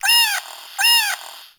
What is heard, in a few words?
Cat Loot Crate Meow; CatLootCrateCommon